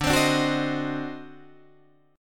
D 7th Flat 9th D down Guitar Open D

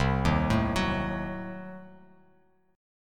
C-Diminished 7th, Bass Standard, chord; arpeggiated; JGuitar

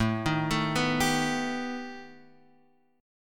B Augmented 7th A

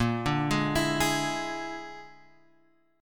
A sharp 7th Flat 5th A sharp, Guitar Open G

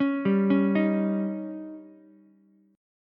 Eb 7th, Ukulele; 6,7,9,6; Eb7 arpeggiated; JGuitar

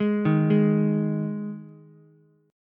G sharp 5th G sharp, down, Ukulele; arpeggiated; JGuitar

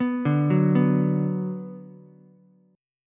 F sharp Suspended 4th Sharp 5th, Ukulele; arpeggiated; down

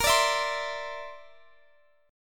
D 7th Suspended 2nd Sharp 5th Bb

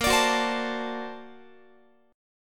A sharp Augmented Major 9th Guitar; strummed; JGuitar

